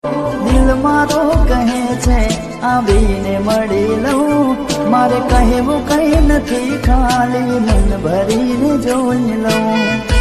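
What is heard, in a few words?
Gujarati Ringtone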